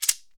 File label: SHEKE, Afro Shakers, Shekeres